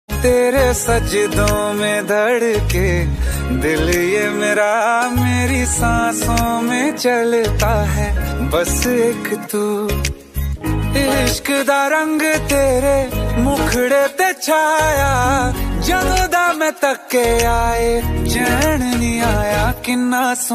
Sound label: song love ringtone, bollywood ringtones